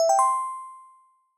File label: Completed task; Syrup 2 Candy Alchemy RPG